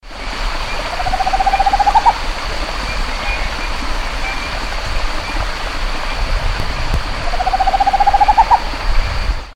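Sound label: Megascops choliba, Santo Antonio do Pinhal SP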